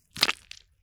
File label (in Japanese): 射精音付属音